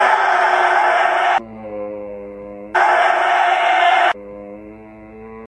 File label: scream and groan